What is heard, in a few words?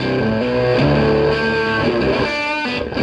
Krush, Electric Guitar; Sonic Foundry (Sony Creative Software) - Friendly Fire